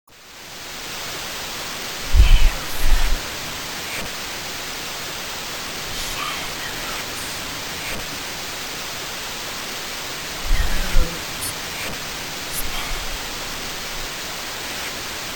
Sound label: Phone Ghost